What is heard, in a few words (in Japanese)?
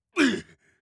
攻撃